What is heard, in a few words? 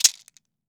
MARACAS; AKAI S-Series CD-ROM Sound Library VOL-5